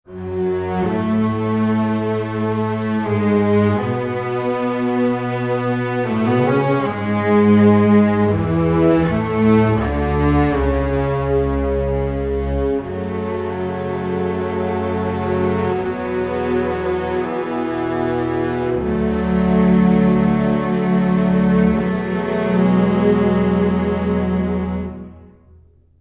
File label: 80 strings low, F#min, Orchestral arrangements; PowerFX - Downtown Orchestra